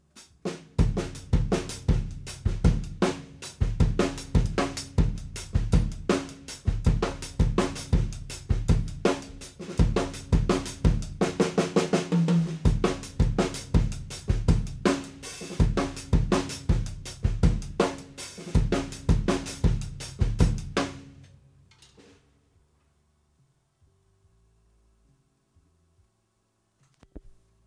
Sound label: raw, drum test